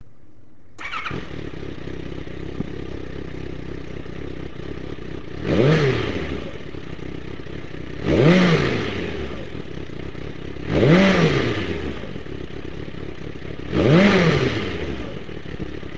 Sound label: standard, muffler, bike; change muffler